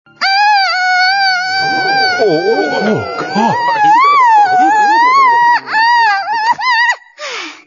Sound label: the kiss